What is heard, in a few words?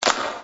Clap